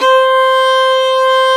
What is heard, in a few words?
VIOLIN C4; Sound & Vision; Gigapack I CD 2; Violins